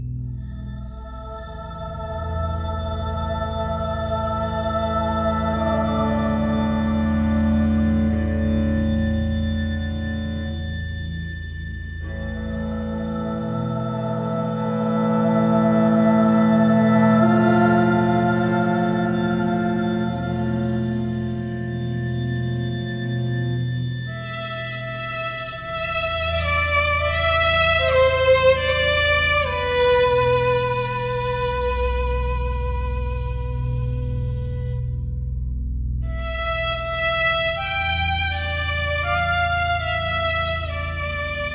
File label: at night loop